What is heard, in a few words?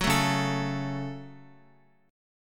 Db Suspended 4th Sharp 5th down Guitar; strummed; JGuitar